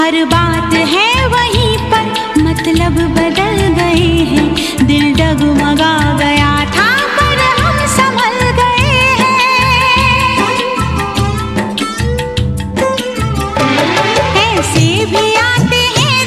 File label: Female Ringtone